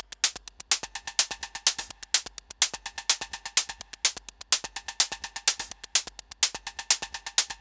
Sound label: 126 top loop sideways